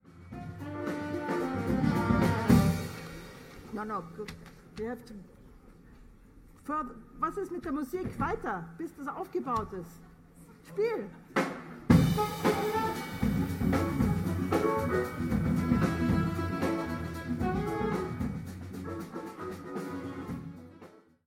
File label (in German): musik spielt weiter